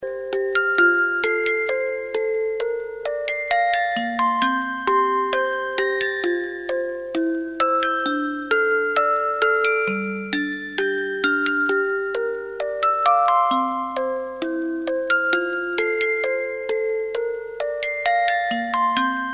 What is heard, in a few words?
Musicbox